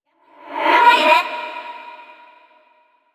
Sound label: VO cheering; yamiko